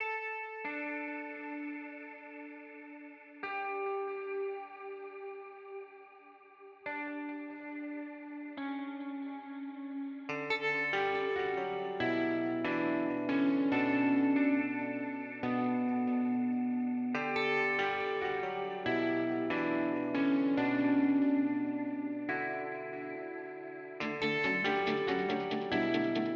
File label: INTRO GUITAR ONLY; KONTAKT LIBRARY